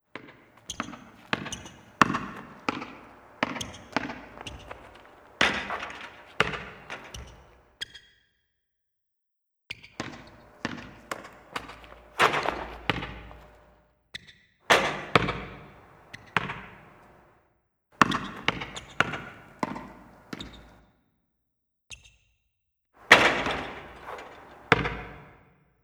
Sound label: Hong Kong Basketball